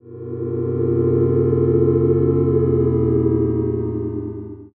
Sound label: cheer far